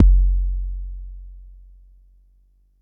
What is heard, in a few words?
Bassdrum, Jomox Xbase09